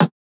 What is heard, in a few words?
wood; foxdot default